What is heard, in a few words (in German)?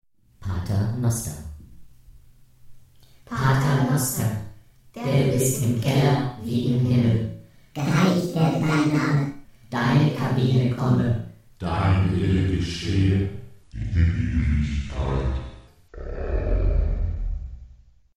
Effekte mit Paternoster